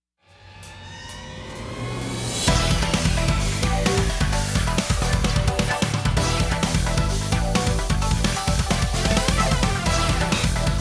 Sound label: backing track; G.O.D. COMPETITION素材